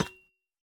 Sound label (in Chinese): 铜 踩踏声